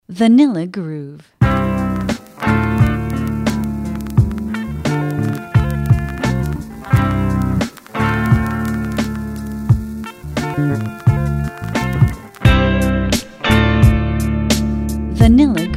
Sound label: Boom Bap Beats n Bits; Add some old school grit to your tracks; loops; drums, bass; Boom Bap Beats n Bits Vol 1